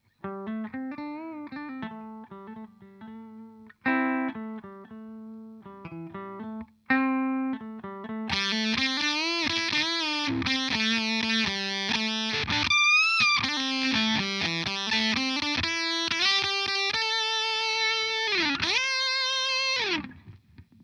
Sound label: 70 vox, pedales